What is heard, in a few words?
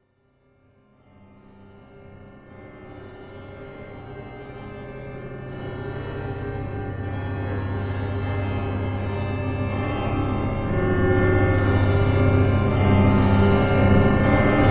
Music Eerie Church, chimes; Music Eerie Church B